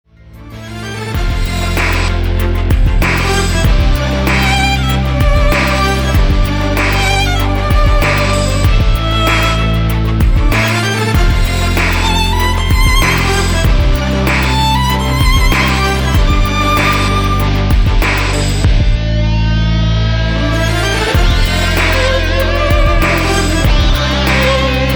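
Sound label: Classics in modern arrangement, piano, electric guitar and violin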